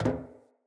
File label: Mockery Walk Metal, Footsteps; Mockeries